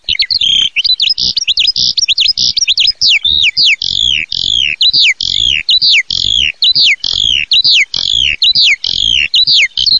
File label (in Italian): allodola, birdsound